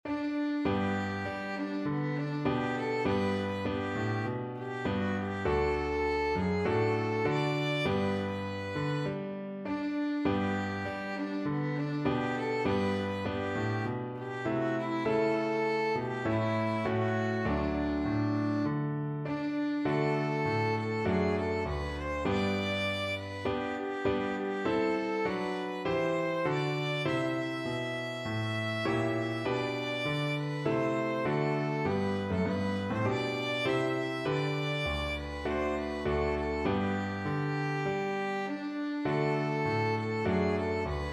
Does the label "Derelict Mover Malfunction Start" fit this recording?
No